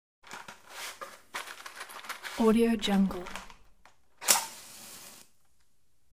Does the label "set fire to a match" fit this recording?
Yes